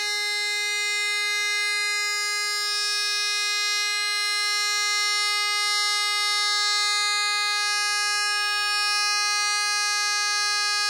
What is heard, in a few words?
low g